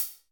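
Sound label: Cym Wetter, Alesis D4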